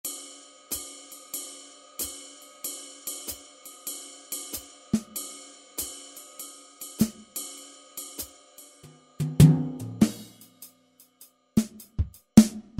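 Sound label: Drums Jazzy Kit, Yamaha EX5R; Factory presets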